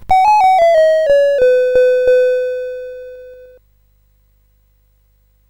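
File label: Mr Wiz, MOS 8580 SID chip music, games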